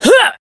Voice attack; minalca

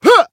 attack; claude

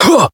Voice attack

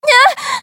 damaged

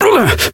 Voice attack; hardy

